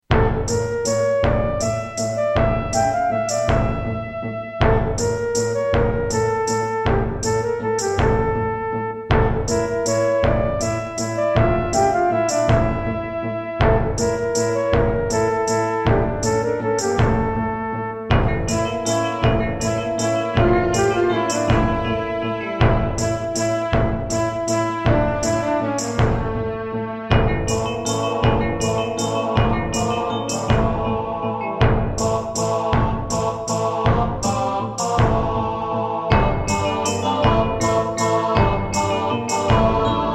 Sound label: Akai SG01k; Settlers II - Track 13